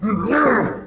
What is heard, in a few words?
vocal; CD Action 36; Wcw